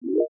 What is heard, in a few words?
Folder Opened mono; iOS 26 Sounds